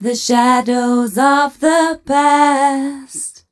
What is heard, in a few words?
f 132 mel, Vocals, fem